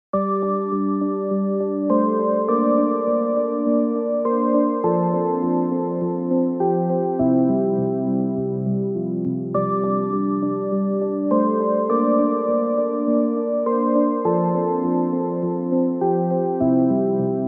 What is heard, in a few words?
Roland Juno-106; a statistical anomaly